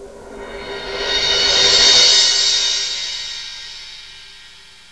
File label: cymbal